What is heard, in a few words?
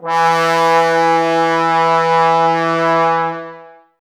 BRS TBS E, Woodwind Brass Ensemble, Brass; Miroslav Vitous - Woodwind Brass Ensemble